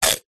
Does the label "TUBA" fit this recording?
No